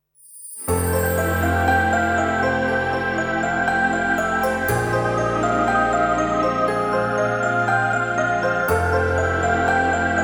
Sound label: angelic music